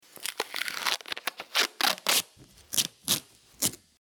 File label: packing tape